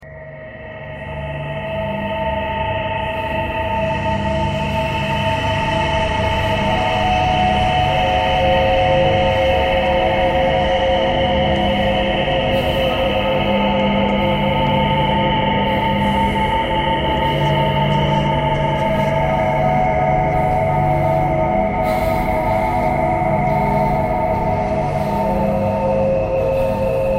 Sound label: thread noise